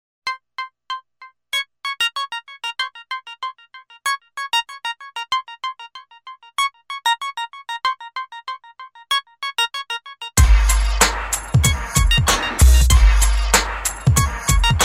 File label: Rap Over This; Soundzabound Volume 5